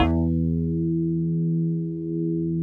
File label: PLUTON CLAV